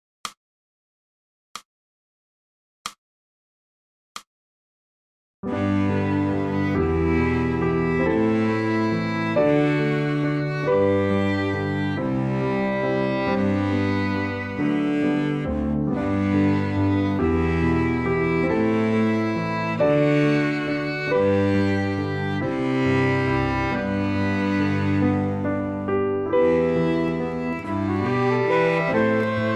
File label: backing track